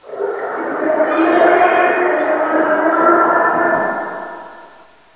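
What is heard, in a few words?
ambience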